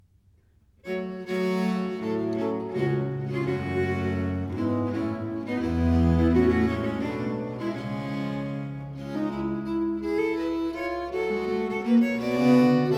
suite no. 5 in four parts, in g minor, English music for viols